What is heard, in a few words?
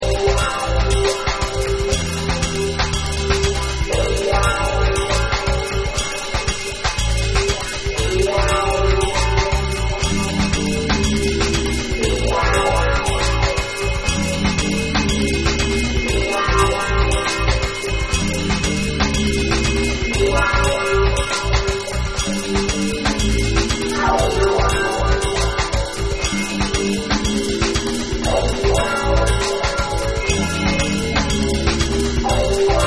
LATIN FLAVA